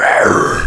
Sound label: pain; Alien Arena; xenoid